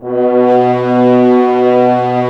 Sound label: Brass F.Hrns B; Miroslav Vitous - Woodwind Brass Ensemble